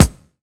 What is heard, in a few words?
kick, Kicks